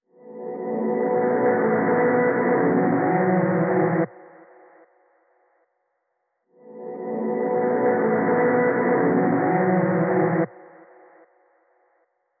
Vocal chop, Backwards 150 F#m; Slate Digital Omnivox Vocal Sample Pack; Chops

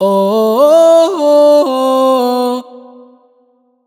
riff oh 108 Em wet, Vocal; Slate Digital Omnivox Vocal Sample Pack

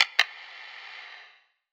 percussion oneshot clicker; Pop; Drums; SD PASADENA Pop Sample Pack; Slate

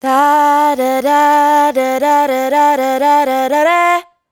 riff F, Dry Vocals; Raw Vocals; Slate Digital Omnivox Vocal Sample Pack

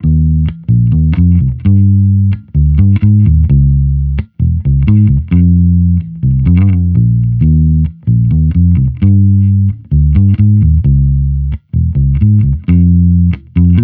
Amped loop 131 Eb, Electric Bass Loops; Slate Digital BASSHOLE Sample Pack

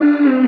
vocal fall C; SD AURORA Sample Pack; Slate

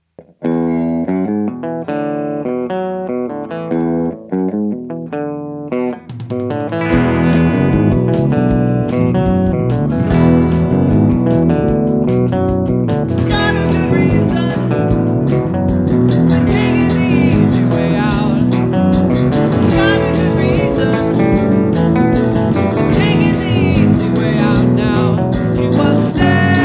Strings

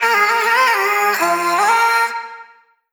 Vocal Sample Pack Phrases Ab; Slate Digital Omnivox Vocal Sample Pack

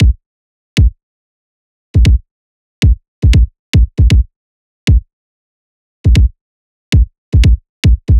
kick loop 117; Pop; Drums; Loops; Slate; SD PASADENA Pop Sample Pack

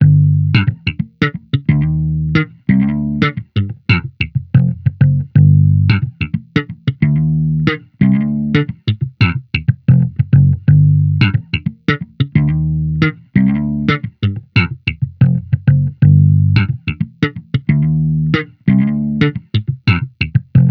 Amped loop 90 Gm, Electric Bass Loops; Slate Digital BASSHOLE Sample Pack

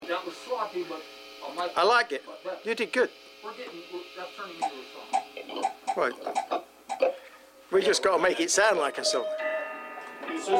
Background Chatter Mix

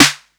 808 Snare; Slate; Vintage Drum Machines Volume 2 808 Edition

